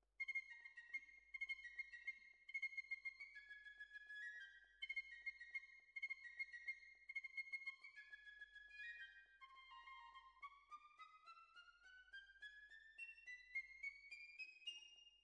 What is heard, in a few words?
Bassoon, Jazz for piano